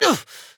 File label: Grunt Jump; paladins; MalDamba